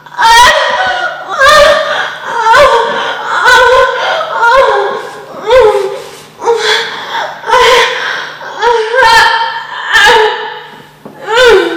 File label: argh